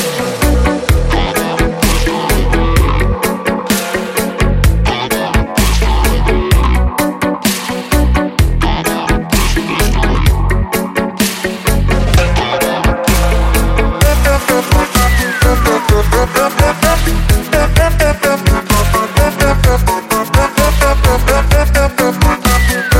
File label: reggae edm